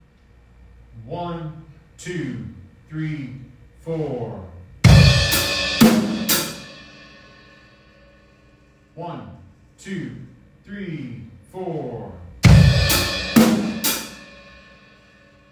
crash cymbal

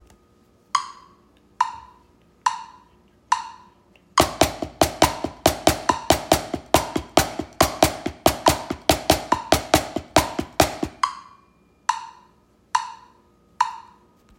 single hand, drums

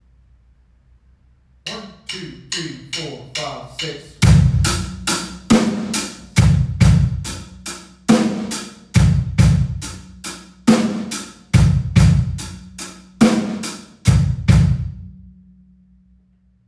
6 8 beat, drums

